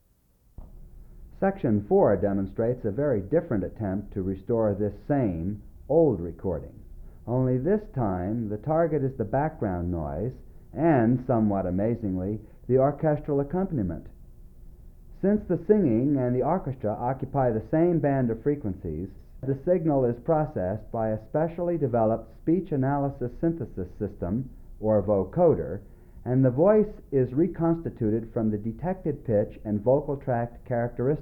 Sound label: Vocoded